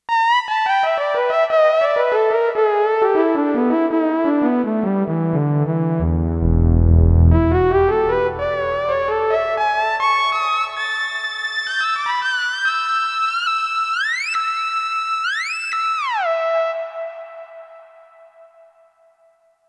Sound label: Gooshy Lead, Kurzweil K2500X; Factory Programs